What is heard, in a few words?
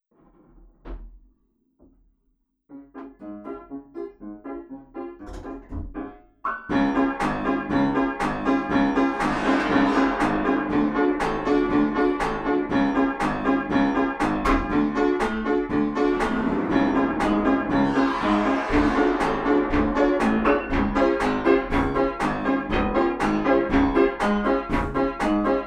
FX Staccato, upright; brasted upright; Snack time